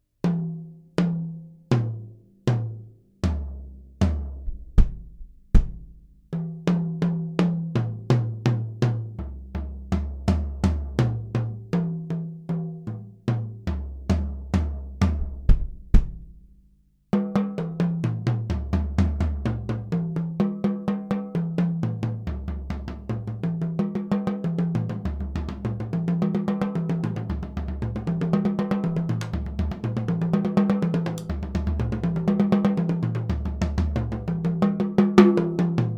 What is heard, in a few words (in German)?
Schlagzeugmikrofone komplett; DPA